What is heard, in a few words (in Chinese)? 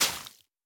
湿海绵 破坏声